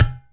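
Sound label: closed tone, UDU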